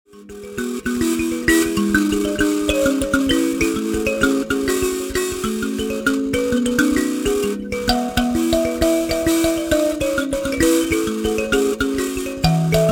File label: mbira